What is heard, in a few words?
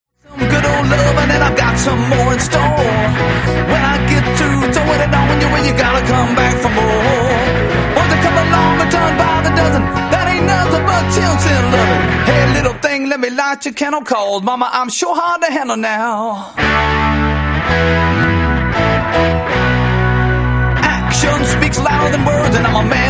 play along drums classic rock minus drums